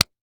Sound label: Impact Hand Slap